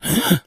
become alert, infected; npc, alert

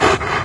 barnacle pull; npc; Garry's Mod; hl2

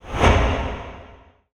quest cancelled; Mount&Blade Warband

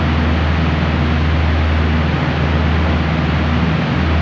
airplane heavy idle; Hearts of Iron IV Death or Dishonor

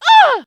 woman hit; Mount&Blade Warband

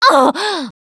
hurt major, russian, Survivor Voice Producer

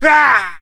man hit; Mount&Blade Warband

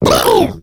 female boomer pain short; voice; Left 4 Dead 2

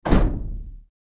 mech step; Sid Meier's Civilization 4 Complete; Beyond the Sword; Units

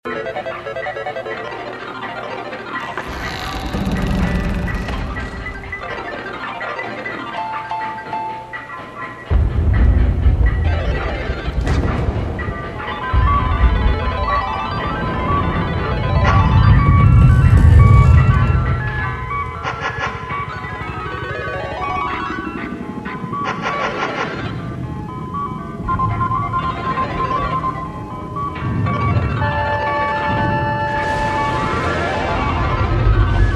casino; Duke Nukem Forever

